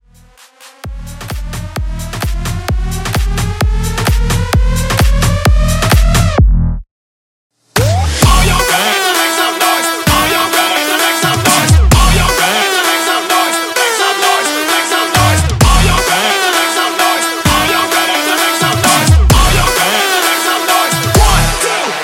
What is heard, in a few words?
130 CLEAN